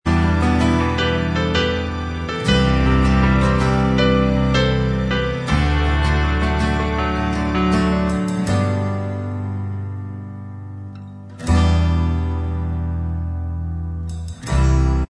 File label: piano Inst Track, backing tracks